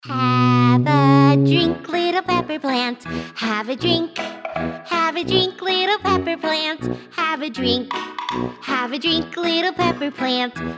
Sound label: Singing For Kids Demo